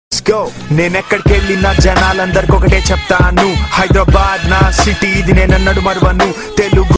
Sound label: huawei mate 10 ringtone